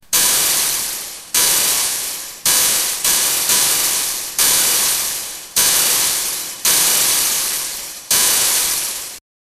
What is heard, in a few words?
gore sound